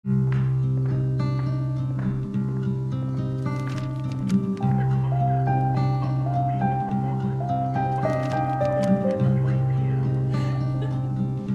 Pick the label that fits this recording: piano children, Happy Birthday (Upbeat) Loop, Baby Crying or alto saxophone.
piano children